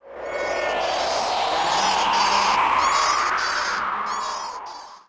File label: wind